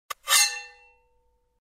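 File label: drew sword from sheath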